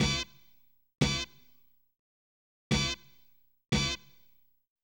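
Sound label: brass, D minor, Hip Hop & RnB; ProSamples vol.34 - Hip Hop & RnB 3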